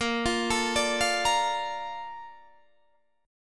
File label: Bb Major 7th, Guitar, Half Step Down